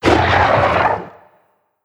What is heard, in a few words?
HUSKED CRAWLER attack